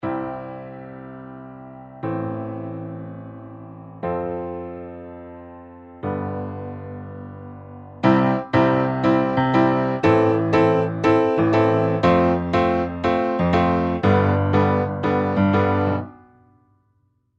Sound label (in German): Pop Rock Klavier; Toontrack EZKeys